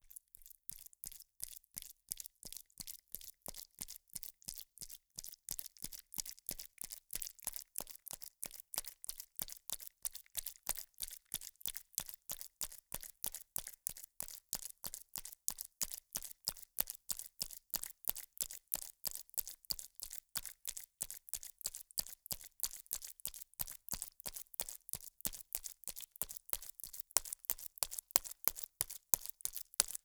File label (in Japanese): ぱんぱん